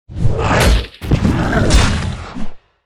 frog; frogmk2

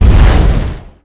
skill explo

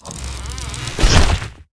poisonplant attack

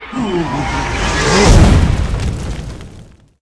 dark evil wizard attack, monster

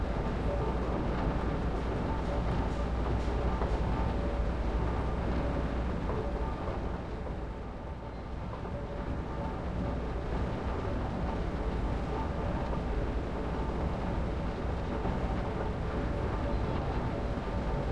corridor, undeadtower

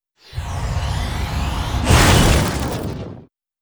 ice lord, monster

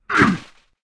dark knight damage, monster

